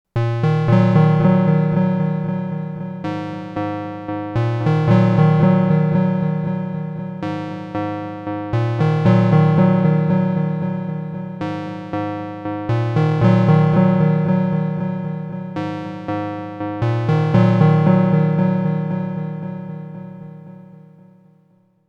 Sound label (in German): Free the Tone Future Factory Synthesizer EQ dunkle Delays